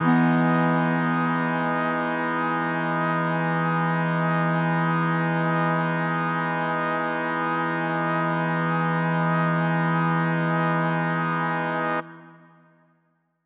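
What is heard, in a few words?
Mixed Winds E major 7, synth orchestra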